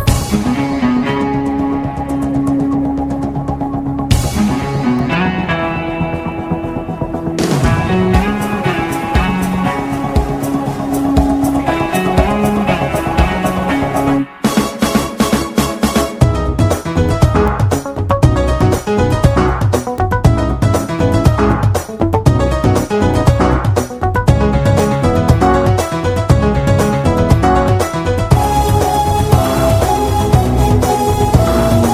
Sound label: BGM Telugu Ringtone